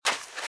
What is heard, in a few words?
Footsteps - Sand - Dry - Run - Left; RadwinIsland